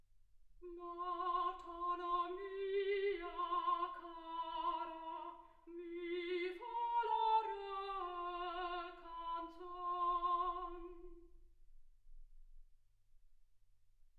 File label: soprano